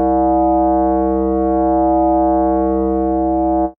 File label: pulse ring; AMG - Vince Clarke Lucky Bastard